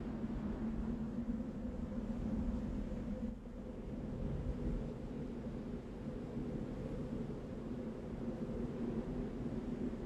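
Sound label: Ambient Sinkhole Loop; silent hill homecoming 2008 gamerip pc ps3 x360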